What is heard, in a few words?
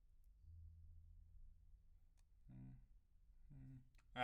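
Overhead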